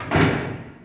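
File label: school locker; Tony Hawk's Project 8; XBOX; shops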